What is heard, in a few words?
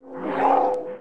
Wolf; Ghost Wolf program close